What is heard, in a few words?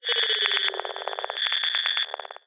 science fiction radio static interference pulse crackle whine